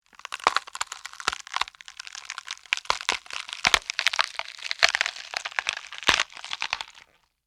Bone Crunch Crack, Horror, Bone, Crunch, Crack, Long; Injury; Combat